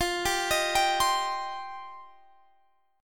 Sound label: F 7th Suspended 2nd F down